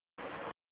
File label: plasmoid